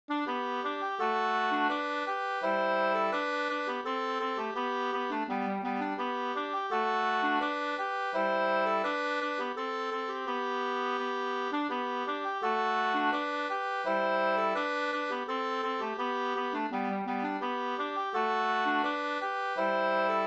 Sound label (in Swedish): ganglat